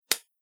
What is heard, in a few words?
Slap Smack Sound; Slap - Smack Sound 4; Slap Sound Effects; combat; Human Body Sound Effect; Andrew Fleming